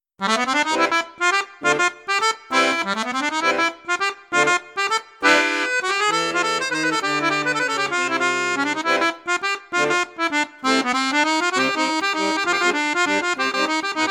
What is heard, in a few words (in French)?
registre flute juste, accordeon; crucianelli king